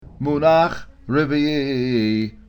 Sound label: munach reveie, cantilation